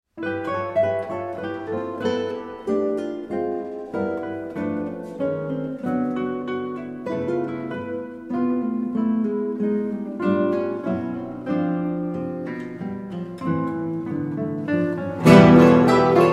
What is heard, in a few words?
concerto for four guitars and guitar orchestra, allegretto